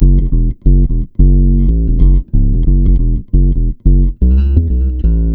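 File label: F# minor, Bass, 90 BPM; Sampleheads; Ultra Freakin' Bass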